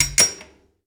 Pellet Machine Arms

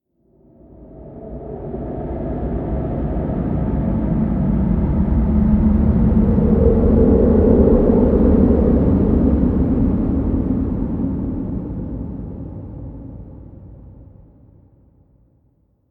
Rnd Abstract Amb

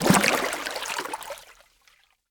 Deep Water Walk; moul-assets

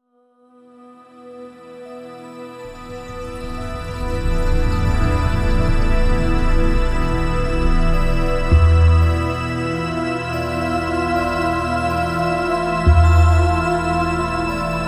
Cathedral Music; moul

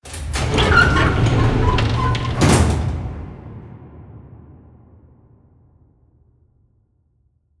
Link Room Door Open

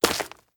Stone Walk

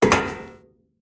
Metal Walk; moul

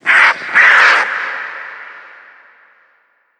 Desert Vulture

